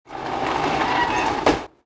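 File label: iron grate open; doors; FEAR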